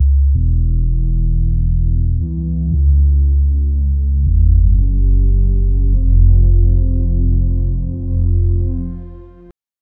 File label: deep sub bass undertone, synth